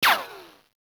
Weapon Hit Effect Metal; Warframe; Caliber Chicks Minigame; Weapons; Hit Effects

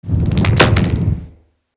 UI Gift Shake

